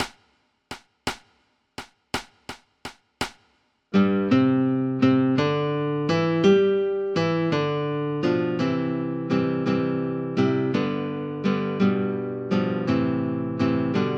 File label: I Saw Three Ships, christmas carols